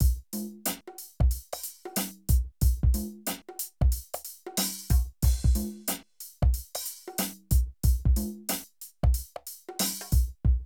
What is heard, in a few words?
Drum loops, Streetsoul; Zero-G Groove Construction (1993)